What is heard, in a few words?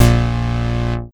Bass Shot A#; Mutekki Media; Vengeance Electroshock Vol.2; Bass Shots